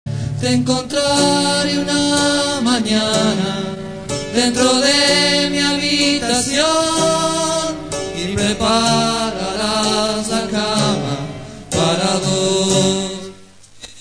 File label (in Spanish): estribillo